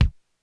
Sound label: Kick, Korg DSS-1 Drums